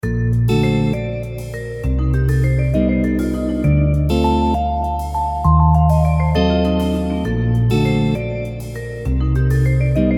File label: jazz